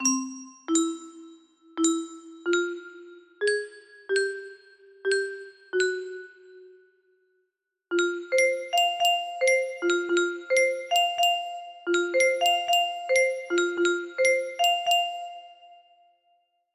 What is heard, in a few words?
music box melody